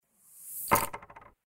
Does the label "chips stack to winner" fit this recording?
Yes